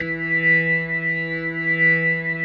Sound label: E3S, B3